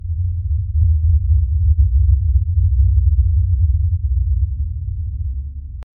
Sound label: low eerie ambience